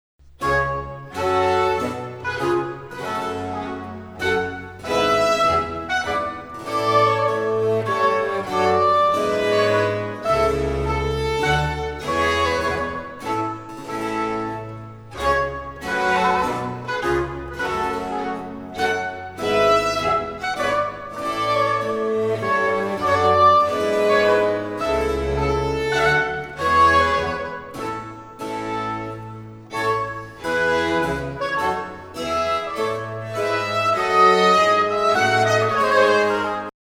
Concerto in G Minor for 2 Oboes and Bassoon En Loure; TWV 53 g1